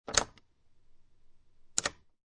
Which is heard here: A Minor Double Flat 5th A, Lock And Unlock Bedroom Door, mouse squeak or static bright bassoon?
Lock And Unlock Bedroom Door